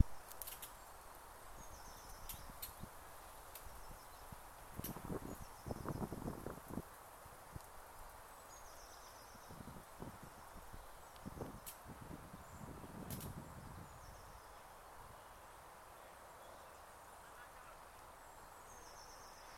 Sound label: creaking branches